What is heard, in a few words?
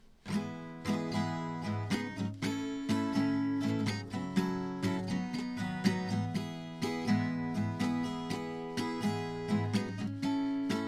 8beat